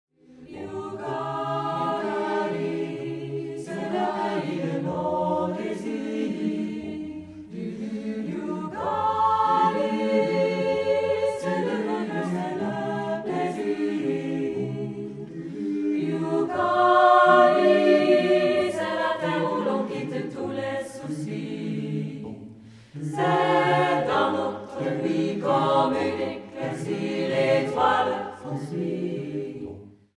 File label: ssatb